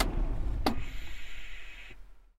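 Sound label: eerie natural unknown rumble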